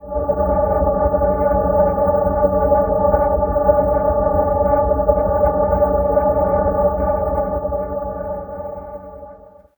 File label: pillar drone C, ancient drones; Zero-G Seismic Frequencies; 90 s Sample CDs